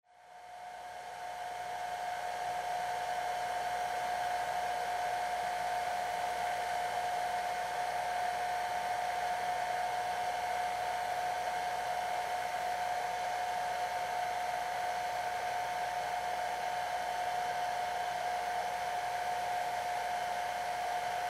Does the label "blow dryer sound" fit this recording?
Yes